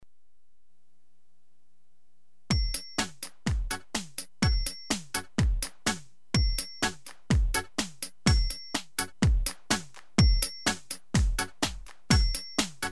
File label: Drums